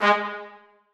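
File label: trumpets staccato g3; Sonatina Symphonic Orchestra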